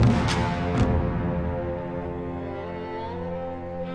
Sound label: mideast suspension break; SAMPLED MUSIC LOOPS